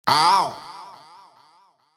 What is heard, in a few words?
ow sound effect